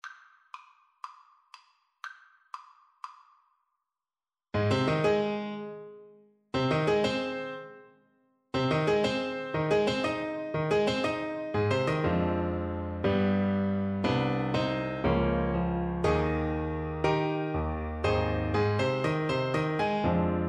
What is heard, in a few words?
Tenor Saxophone